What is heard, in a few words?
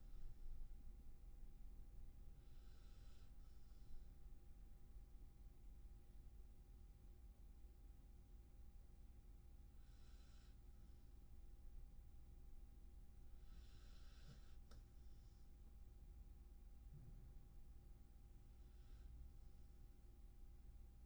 roomtone